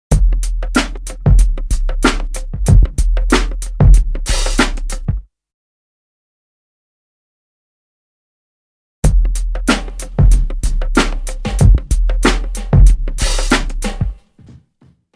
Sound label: Drum loop; BEST OF DRUM BREAKS 30